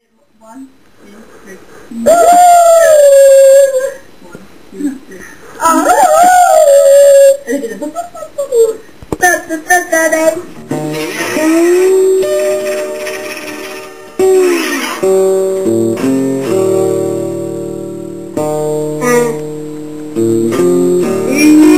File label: rainy day blues, Blues guitar